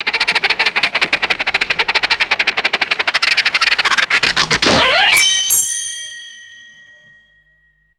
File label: rhythmic rubbery squeaks and metallic clang, cartoon